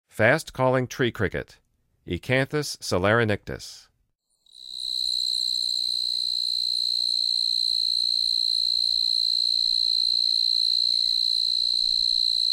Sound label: Fast-calling Tree Cricket, Insects; The Songs of Insects